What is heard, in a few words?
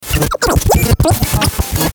Tuner Static Frequency Sweep; Tuner Static Frequency Fast Sweep; Communication